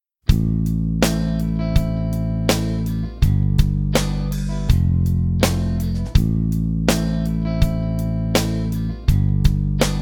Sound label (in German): akkordverb moll i moll v iv dur